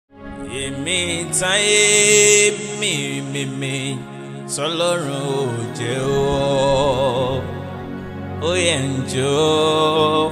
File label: worship ambience; Olorun; Worship Ambience Series; prayer chant